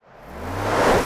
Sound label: Slow Warm Reverse Fx Rvrs Gun Shots; Nova Sound